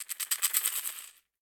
Caxixi FX; Latin Percussion; Drums; Drum Hits; Shaker; Ableton; Factory Packs

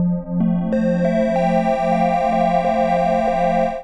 F Major 125 bpm, pads; MIDI Clips; Tonal; Rabbits Dream; Skitter and Step; Ableton Factory Packs

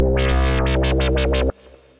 Canteloupe Flutter Bass

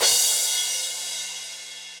Crash 16 Studio Basic, Cymbal; Drums; Drum Hits; Standard Hits; Session Drums Studio

